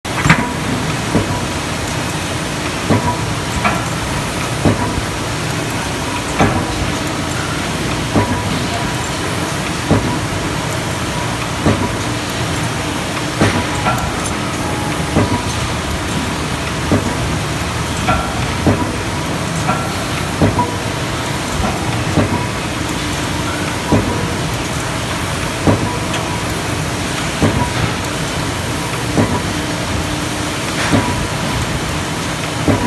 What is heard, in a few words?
normal cue, anomaly sound